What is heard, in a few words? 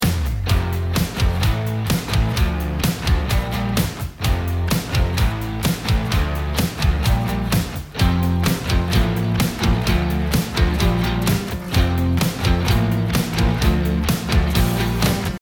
start round, music